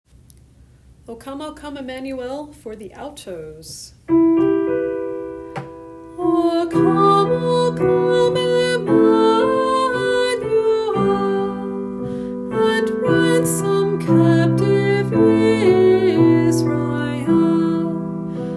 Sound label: alto; O come Emmanuel